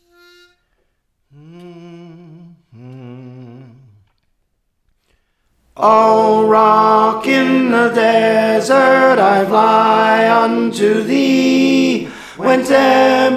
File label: Tenor, Praise For The Lord Training